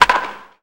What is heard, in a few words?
Baseball Bat Crack